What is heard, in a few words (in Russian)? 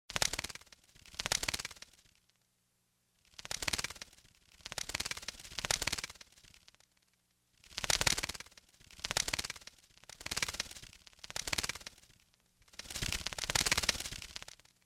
letuchaja myshka letaet tuda sjuda